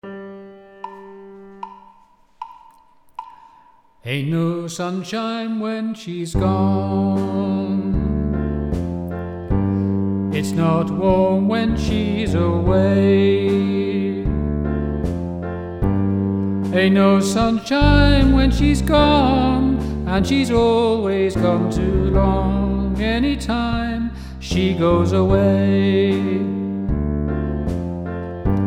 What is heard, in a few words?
Vocal